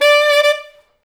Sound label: SAX Alto STAB, Alto Section FX